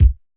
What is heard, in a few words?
pop kick; japanese pop